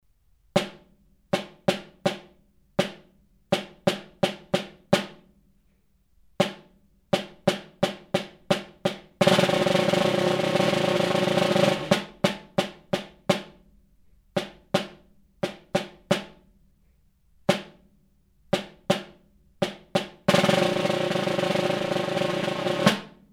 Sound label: snare